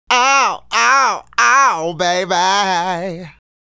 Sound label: Male Solo, Vocal; Sonic Foundry (Sony Creative Software); Vocal Toolbox